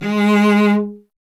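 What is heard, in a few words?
strings cello G#; Miroslav Vitous; Solo Instruments VOL-1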